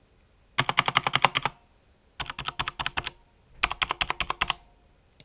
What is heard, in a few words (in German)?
Tastatur Leertaste Back Enter